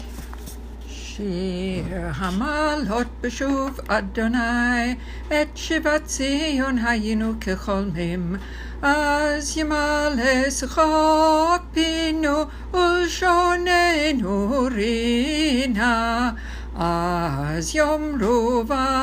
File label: Shir Ha maalot German Melody for Shabbat